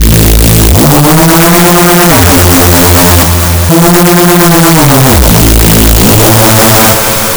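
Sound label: bass classic reese; fabrik plus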